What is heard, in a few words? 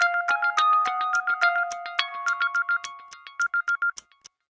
Alarm Masterpiece; Samsung Gear S; Alarms